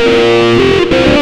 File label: xtra lead